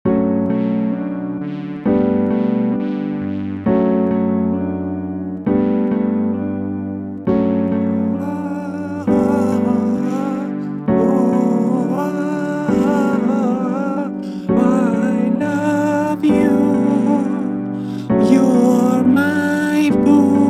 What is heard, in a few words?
b minor